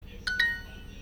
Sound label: correct effect; duolingo